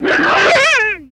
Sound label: Monster Snarl Scared, bears